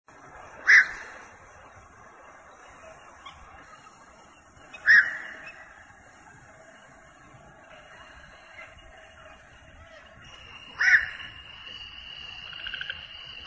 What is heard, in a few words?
black crowned night heron; birds